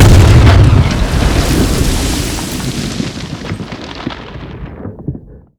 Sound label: Explo mine, Explosions